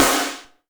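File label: snare drum, Real Mega Drums; Best Service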